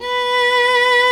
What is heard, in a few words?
B3 solo viola; InVision Interactive; Lightware VOL-1; Instruments & Percussions